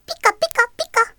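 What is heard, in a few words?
pika pika pika, Pokemon crys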